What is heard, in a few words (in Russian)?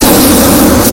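шум распыляющегося вещества из баллончика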